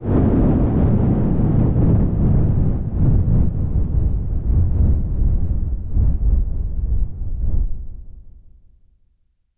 thunder

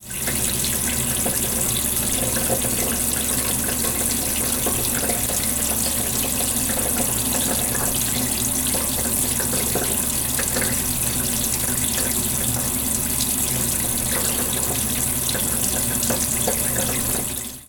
faucet

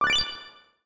button

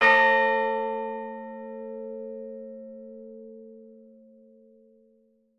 bell

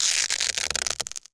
tape

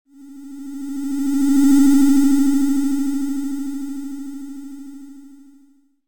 ufo

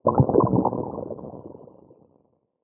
magic ice

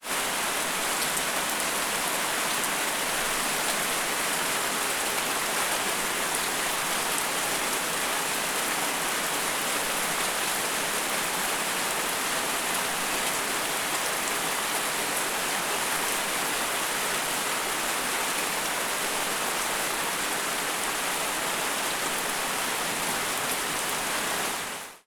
rain

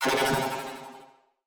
beam gun

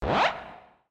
button

